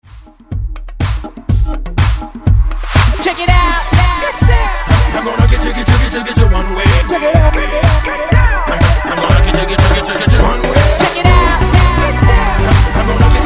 Hype 123Bpm; DJDOLLS

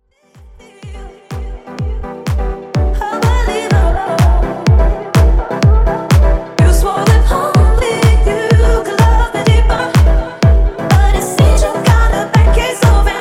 House Remix 125Bpm

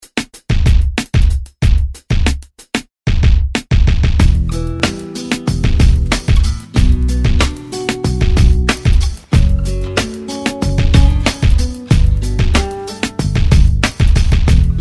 ReDrum 94 Bpm; DJDOLLS

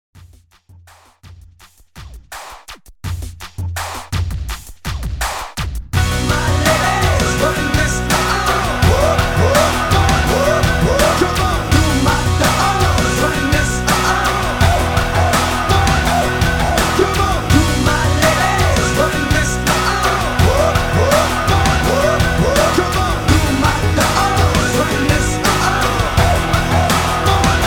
HYPE REDRUM 83 BPM

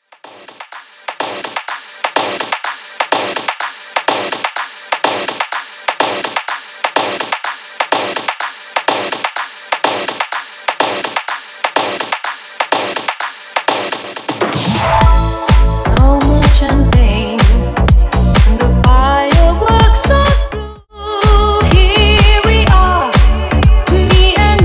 Dance 125BPM

